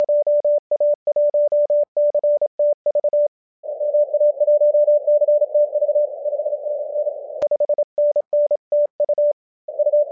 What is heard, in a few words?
14MHz CW